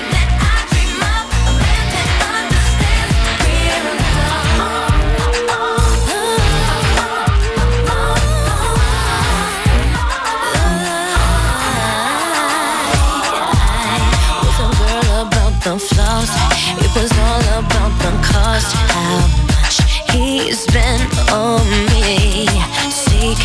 hiphop

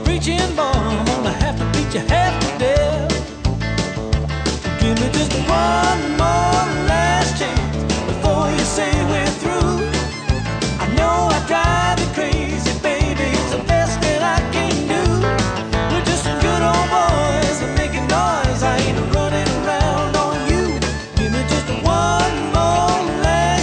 country

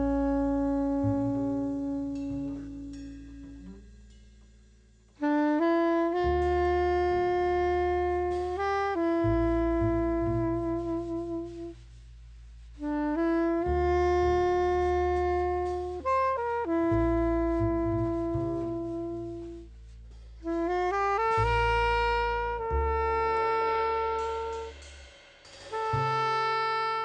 jazz

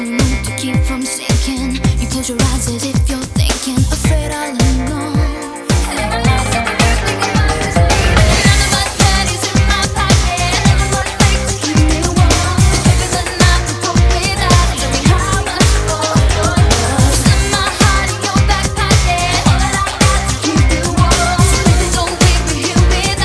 pop

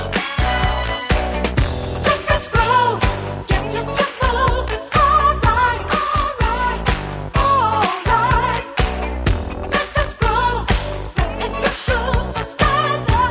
disco

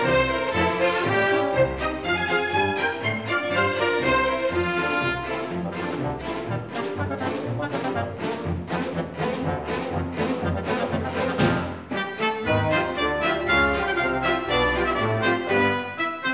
classical

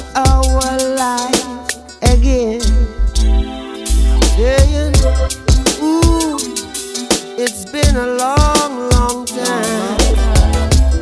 reggae

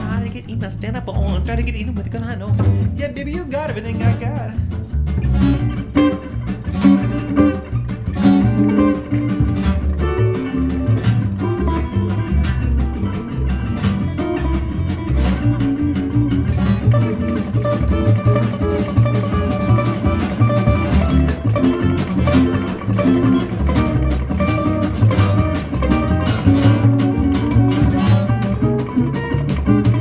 blues

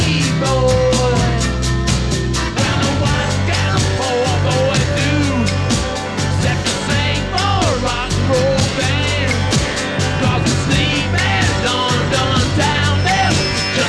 rock

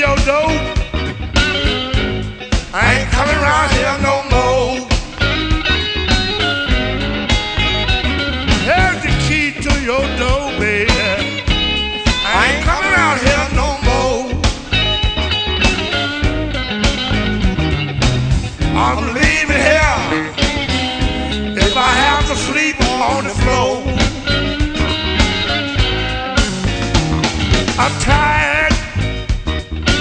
blues

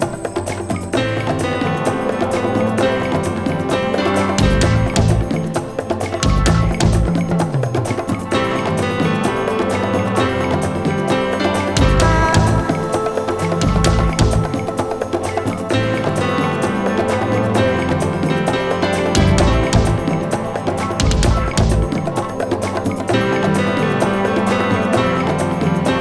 rock

